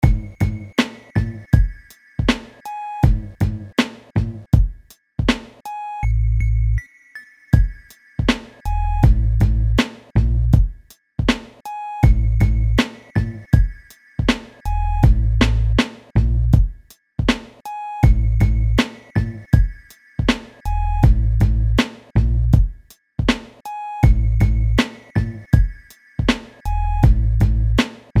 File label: ultimate beat